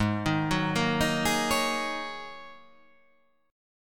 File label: G sharp 9th Suspended 4th G sharp